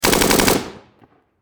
Warfare Machine Gun Bren Lmg Long Burst Close Perspective; SFX Bible 6